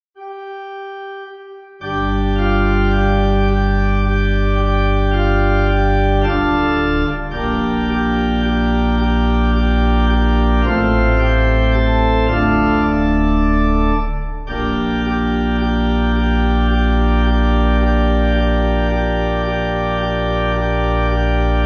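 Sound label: Pipe, Pipe Organ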